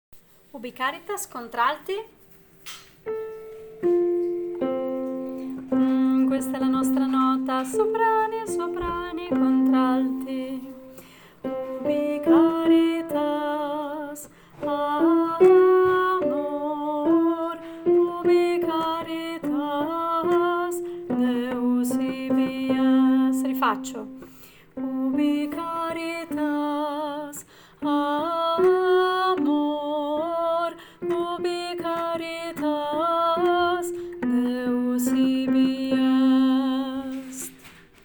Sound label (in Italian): ubi Caritas contralti